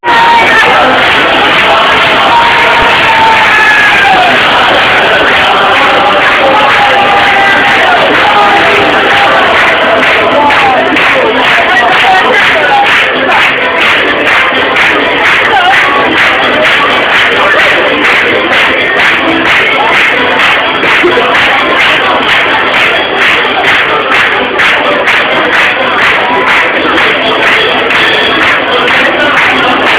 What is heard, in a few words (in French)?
clap solo guitare; sinfunia; NatalePatriotti2004